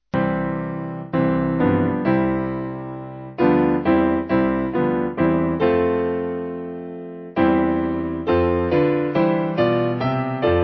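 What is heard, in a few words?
Piano, backing; Christians Awake; Yorkshire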